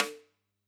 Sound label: close Rim, drum